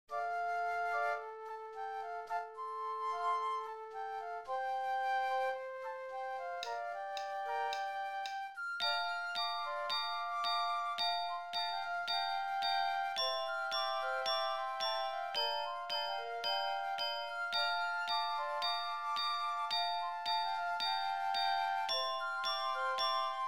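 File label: Lyra, 110 bpm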